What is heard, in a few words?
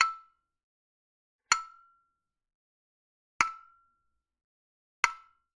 Generic Click Glass Tiny